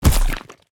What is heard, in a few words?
body hit finisher